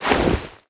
arm oneshot, foley

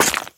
spew, infestation, bulb

